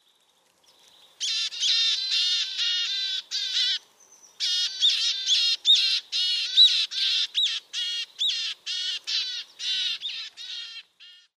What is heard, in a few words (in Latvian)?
poemon paris uztraucas; a.celmins